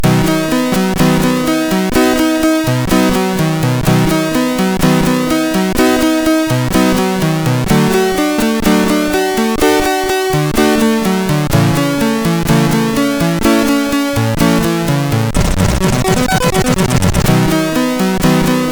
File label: Orchestra, MOS6581; MOS6581R3; hvsc